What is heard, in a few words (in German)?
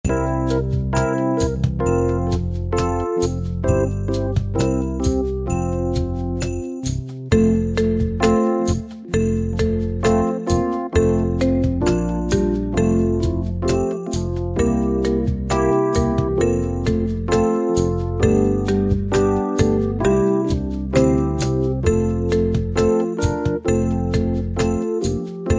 Jingle Bells Playback F Dur